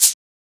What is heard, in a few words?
Shaker; FLPRO